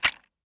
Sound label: Lift End; doodle jump 2; android